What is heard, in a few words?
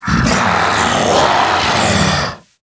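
alert, zombie male; nmr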